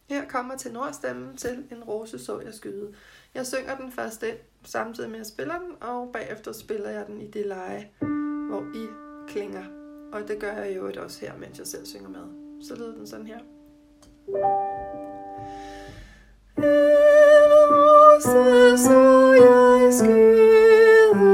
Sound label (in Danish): tenor